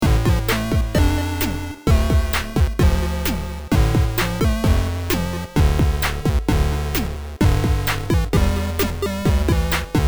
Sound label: evil forest, Chiptune inspired music; Chiptune inspired music pack